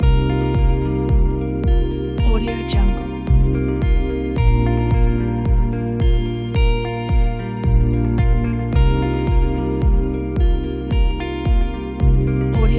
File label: uplifting ambient